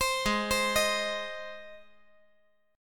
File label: G sharp Major G sharp, chord